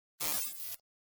Button Sci-Fi Beep; Hollywood Edge; sound designer's toolkit v2

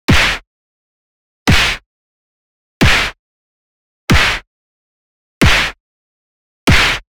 PUNCH Basic Hit Old School; boom library; Anime Essentials

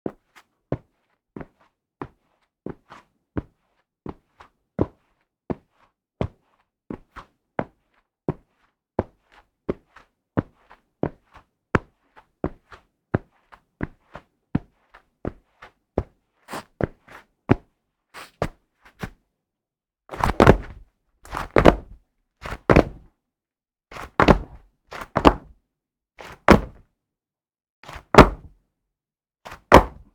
carpet walk & jump, footsteps; NS Studios - ultra sound collection

